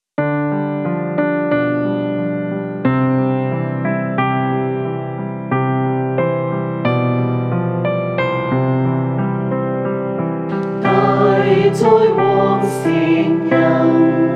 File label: choir